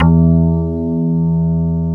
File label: WOOD BAS; Synclavier Sampler Library; KEYS AND GUITARS